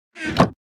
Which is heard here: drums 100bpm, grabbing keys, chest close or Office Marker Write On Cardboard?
chest close